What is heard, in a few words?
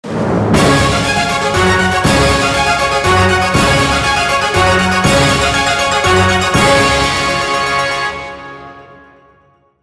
mega win; games; Big Joker